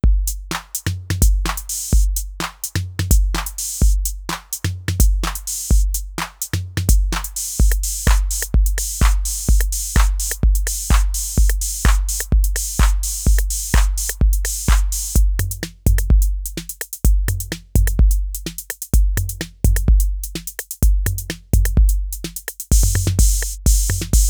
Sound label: dj 505 loops